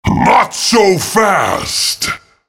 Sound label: spell cast effort, en US; Rengar